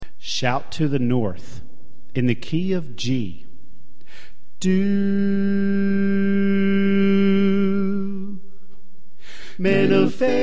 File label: Bass Left